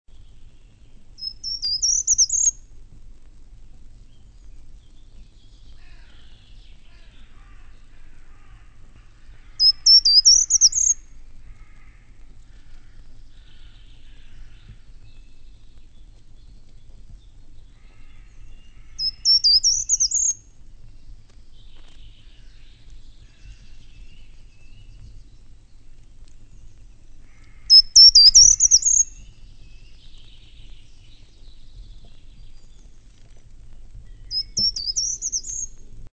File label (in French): Grimpereau des jardins